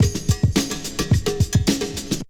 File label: D3 BREAK